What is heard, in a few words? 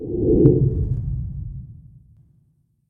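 telekinesis pickup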